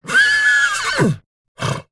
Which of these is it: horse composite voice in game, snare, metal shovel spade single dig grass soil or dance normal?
horse composite voice in game